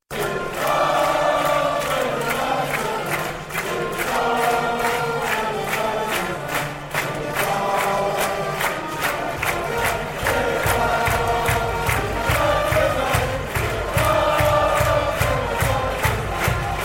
swiss football chant steht auf wenn ihr schweize seid